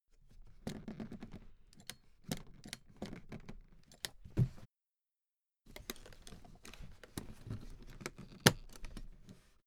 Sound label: suitcase handling soft